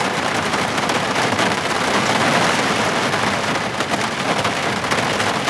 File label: Amb Weather; Red Orchestra 41-45